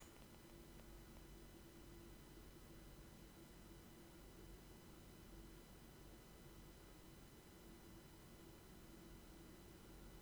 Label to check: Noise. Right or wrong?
Right